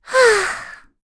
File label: Sigh; Dosarta; kingsraid; voices heroes